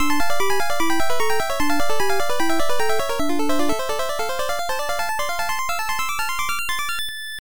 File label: Fanfare; milon s secret castle nes